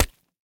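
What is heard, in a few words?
silent shot with silencer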